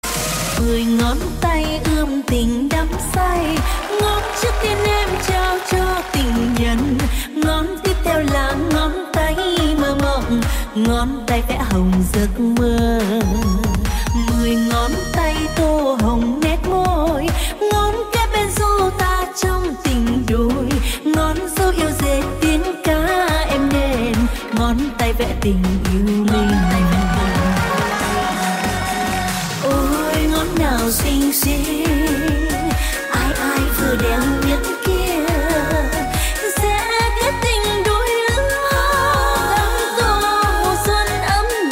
remix; nhac remix

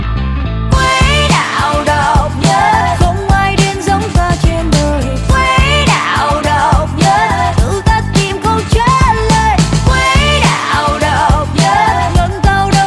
Nhac Chuong, Diep Khuc

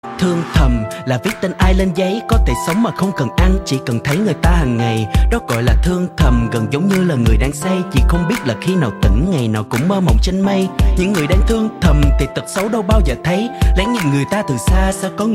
NHAC CHUONG (RAP); nhac tre

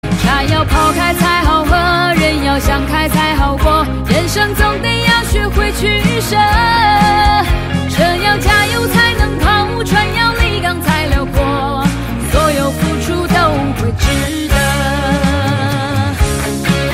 nhac chuong

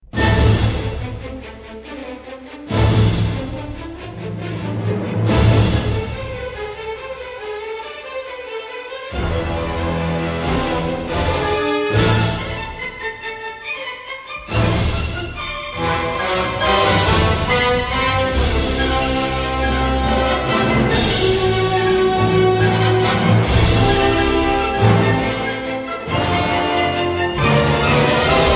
Nhac nen ke truyen lich su su thi chien dau hoanh trang

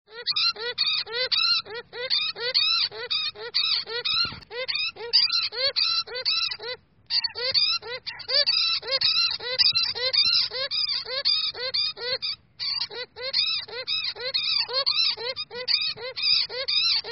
tieng chuot keu cuu

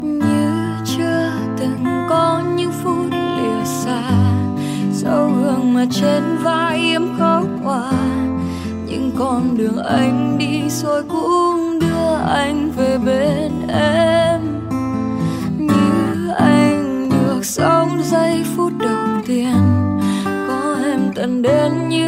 Nhac Chuong Piano Version

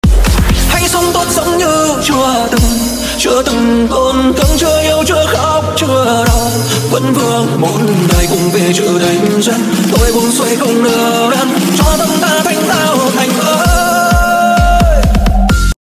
remix tiktok

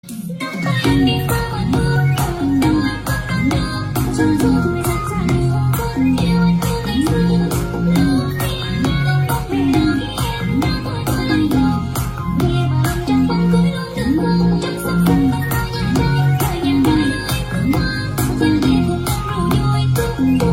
nhac chuong remix giong nu

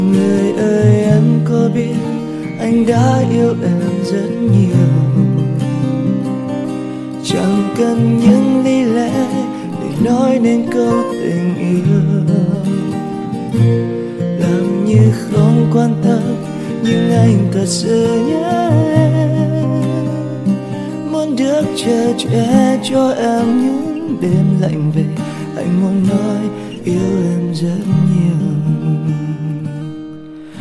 Nhac Chuong Acoustic

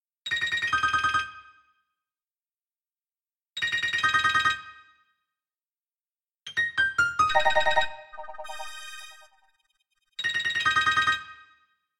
Nhac Chuong Nokia Tin Can

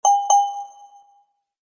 Nhac chuong tin nhan viber

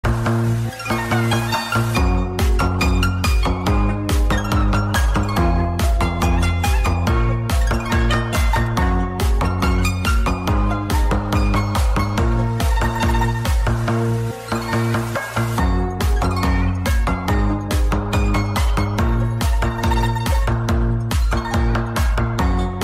nhac chuong night of the piano remix

